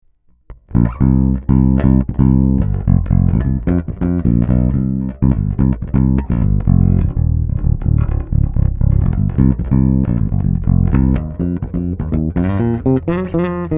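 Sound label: Ibanez SR 756 Neck PU Passive; IBZ SRX756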